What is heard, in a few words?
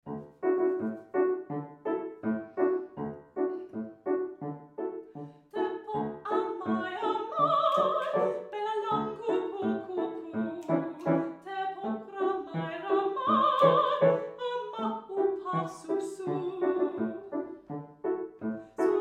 Malay folk song; Tepuk Amai Amai